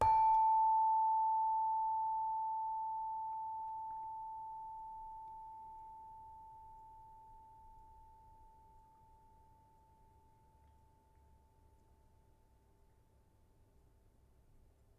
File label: Saron soft, gamelan